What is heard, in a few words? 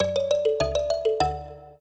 trio, mbira; Mozart